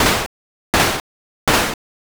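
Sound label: footsteps water walk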